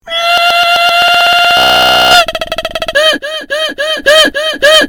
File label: pig fall; bad piggies; ytp